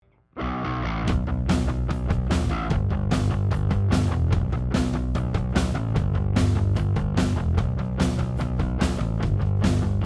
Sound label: Drums and Bass